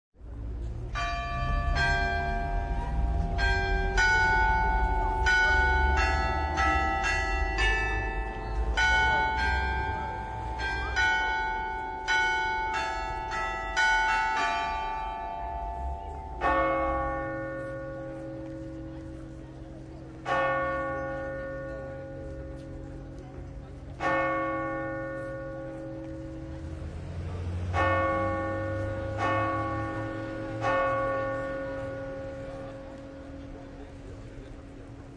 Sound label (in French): cloches complet; lourdes